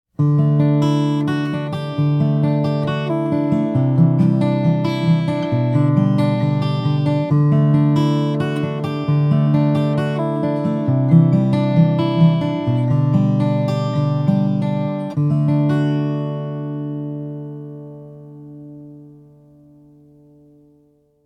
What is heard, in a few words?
Taylor 224ce k dlx fingerstyle